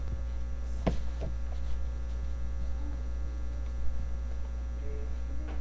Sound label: howl